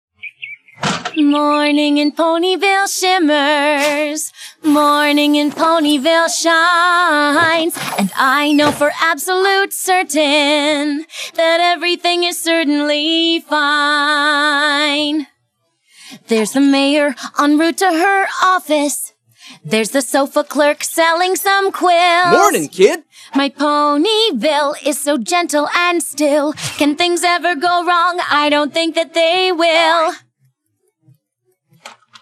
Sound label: Acapella; Season 3